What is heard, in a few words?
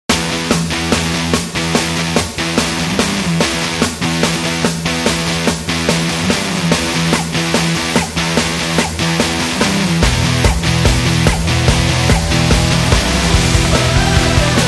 Upbeat Energetic Indie Rock